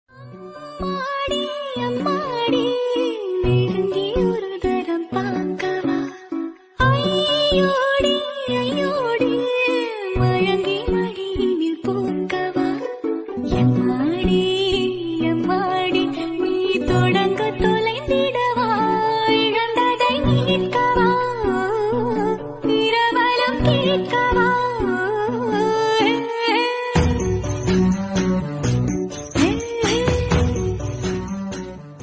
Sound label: Tamil Song Ringtone